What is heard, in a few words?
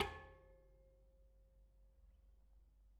Bicycle Spoke